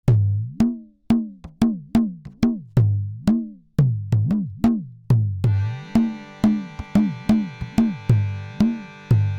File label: Drums